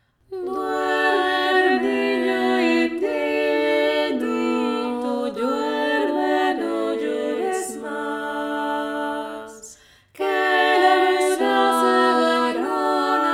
toutes les voix, Une très belle berceuse guatemaltèque; Duérmete niño indito; trad guatemala

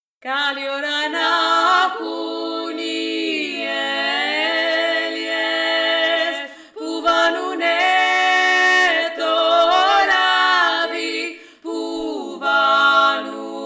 TOUTES LES VOIX

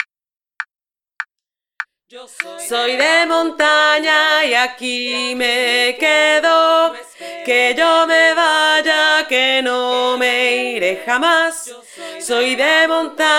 VOIX MILIEU

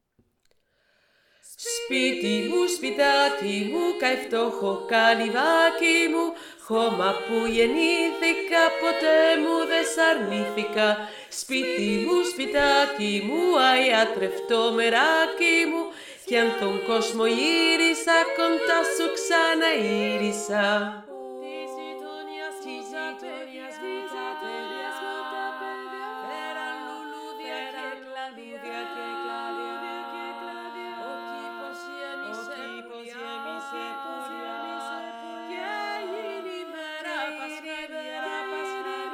voix

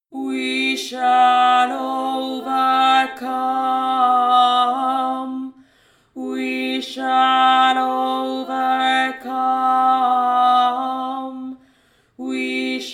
VOIX 1; WE SHALL OVERCOME

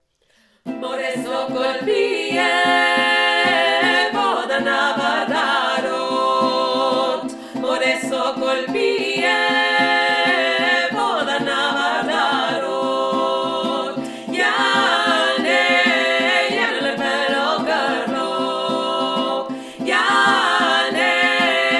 3 VOIX

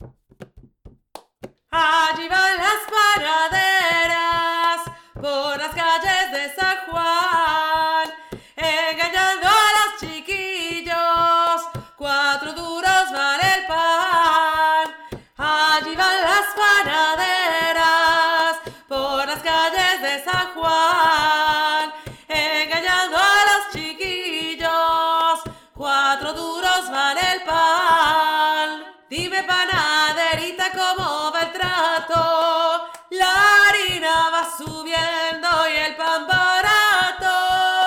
voix principale; LAS PANADERAS

